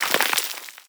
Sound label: debris dirt, bullet impact debris